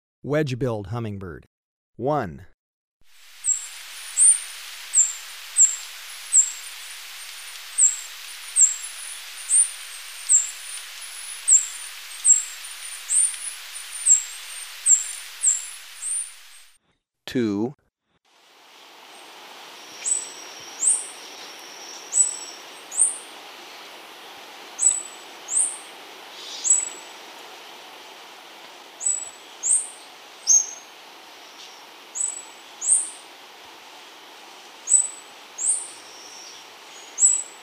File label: Wedge-billed Hummingbird, Birds of Ecuador; S. America